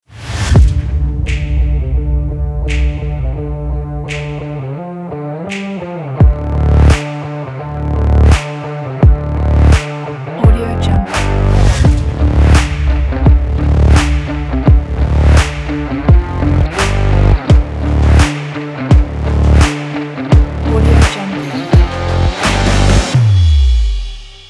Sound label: sports intro kit